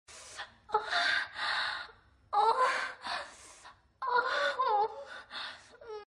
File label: sex, moans; The Witcher Hunt